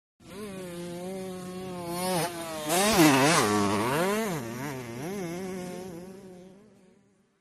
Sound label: Motorcross Jumps By, motocross